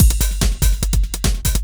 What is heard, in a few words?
Beat, Drums & Percussion; Electrocution; Beats